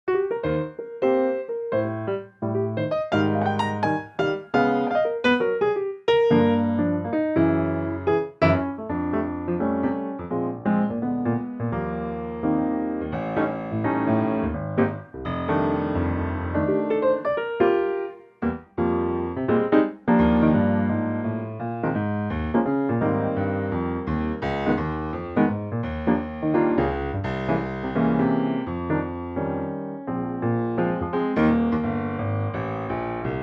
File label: piano Eb; Pianotrak; vocal range - Bb to C; The classic showtune from South Pacific in a swinging piano only arrangement.